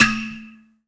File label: percussion special